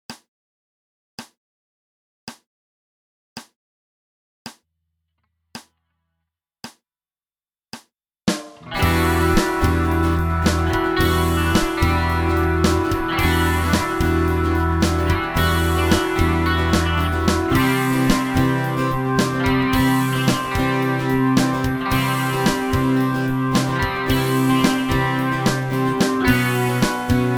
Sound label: backing track; fast solo